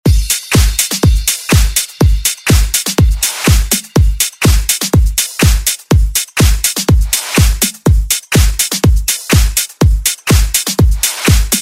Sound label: Pop Dance Redrum